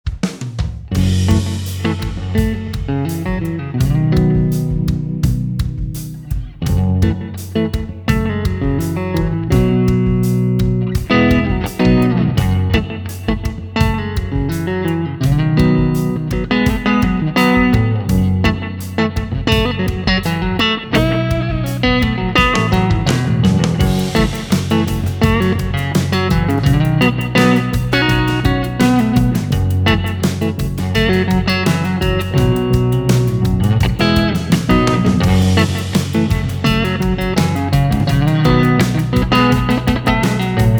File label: Clean Amp Reverb Delay in FX Loop